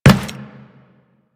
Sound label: Run Metal, Footsteps; Warframe; Teshin